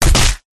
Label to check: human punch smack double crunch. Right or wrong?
Right